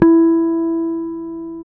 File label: E-Basses, Roland